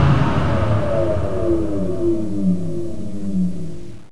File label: hum; sven; caged; ambience